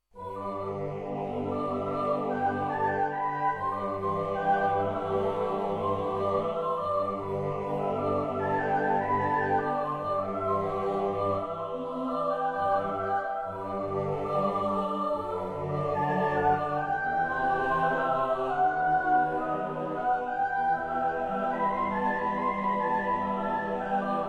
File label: Choir